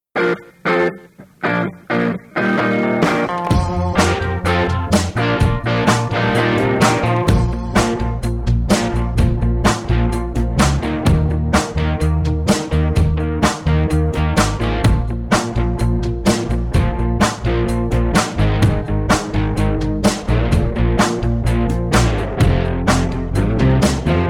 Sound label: backing tracks in Bb